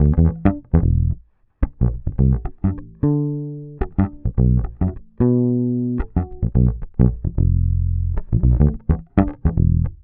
Bass loop 110 Em, Electric Bass; Electric Bass Loops; Slate Digital BASSHOLE Sample Pack

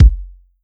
Kick, 909

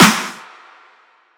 snare longjohn; Drum One Shots; SD MIDNIGHT Sample Pack; Slate

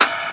ride static, Drums, One Shots; Slate; AURORA Sample Pack

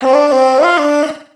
phrase Dm, Vocal; Slate Digital Omnivox Vocal Sample Pack

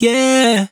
riff Airy Run Gm, Vocal, Dry Vocals; Raw Vocals; Slate Digital Omnivox Vocal Sample Pack

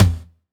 Tom Halted, 909 Toms; Slate; Vintage Drum Machines Volume 3 909 Edition

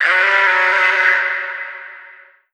Omnivox oneshot Bm, Vocal; One Shots; Slate Digital Omnivox Vocal Sample Pack

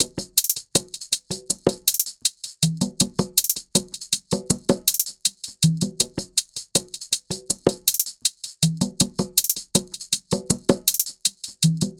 perc 80; Drum Sample Pack; Loops; SD SEISMIC; Slate

